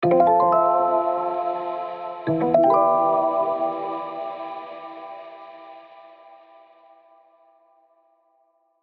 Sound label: jingle